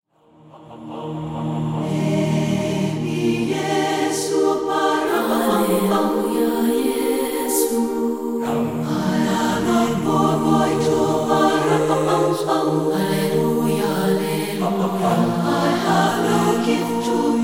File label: A cappella